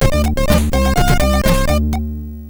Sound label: sid MOS6581R4